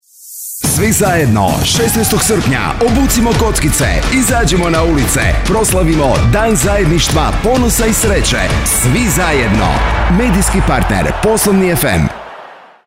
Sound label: jingle; Nema prosperiteta bez zajedništva